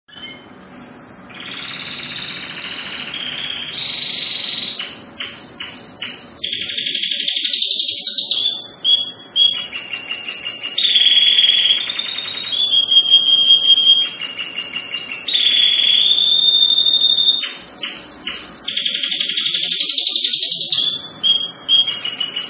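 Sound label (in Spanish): Canario Timbrado Español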